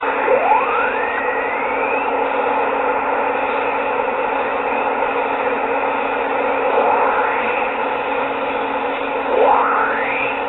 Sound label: morse